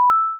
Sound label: coin, Digital / Game Sounds; 0.4s